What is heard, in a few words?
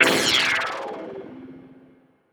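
deep house FX, 125 bpm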